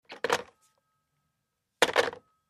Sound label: Tel Hang Up Rotary